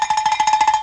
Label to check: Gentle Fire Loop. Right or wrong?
Wrong